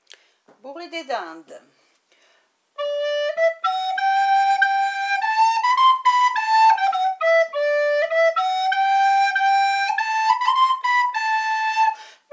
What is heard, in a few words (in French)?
dindes, FLUTE